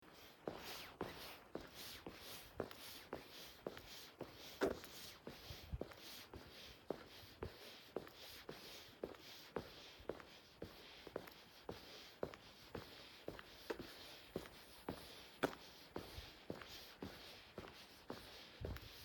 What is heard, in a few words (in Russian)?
shagi v kurtke po asfalitu